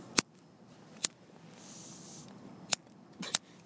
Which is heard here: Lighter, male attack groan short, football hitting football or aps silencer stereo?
Lighter